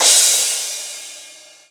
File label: Crash, Cymbals